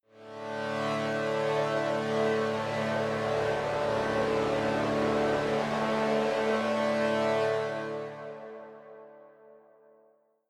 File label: space supportive alienation, drones